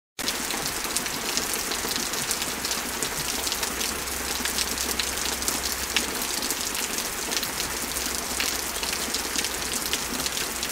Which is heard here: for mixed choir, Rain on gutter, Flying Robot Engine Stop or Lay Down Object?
Rain on gutter